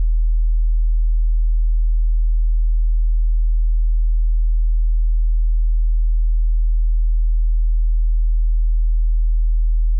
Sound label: Sub bass 40hz